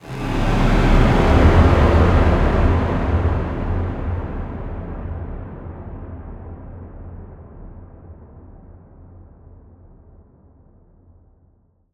afterlife ambient transition, Ethereal Ambient; BluezoneCorp

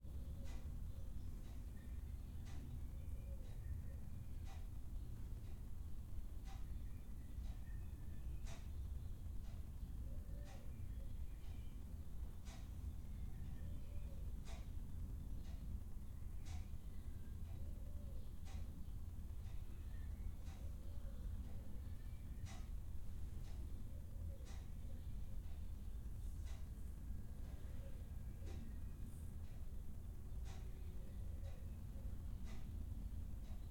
Quiet Room Flat Livingroom; Systematic Sound; General Ambience Series; Quiet Rooms 01

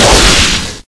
attack charge, antlion; hl2

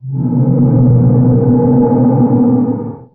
water growl, ichthyosaur; hl2

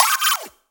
loading, Digital Sci Fi UI; The Mooseman

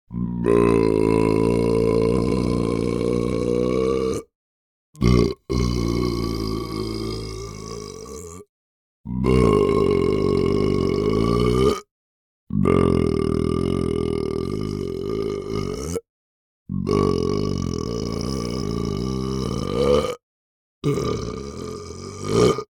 BURP Long Reg End Raise Up

